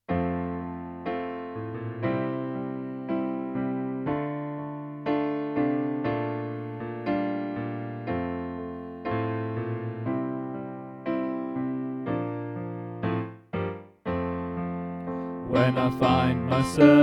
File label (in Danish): Sopran; oevefiler